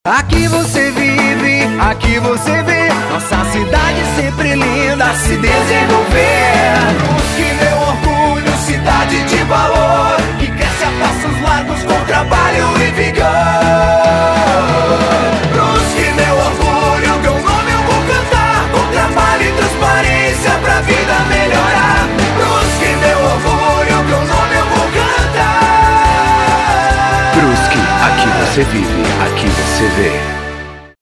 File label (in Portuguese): jingle; brusque